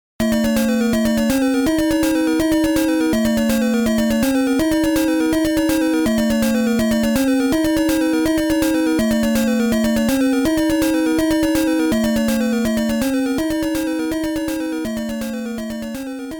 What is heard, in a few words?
boss battle; sml